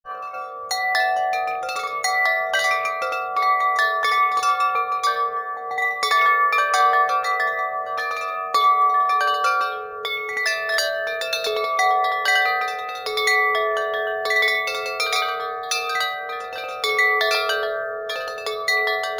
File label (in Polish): dzwon wielki, fengshui; dzwonki